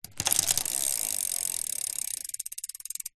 vehicles bicycle short pedal then stop coast wheel hub clicks